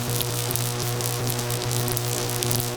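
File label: electrocution; high voltage power line buzz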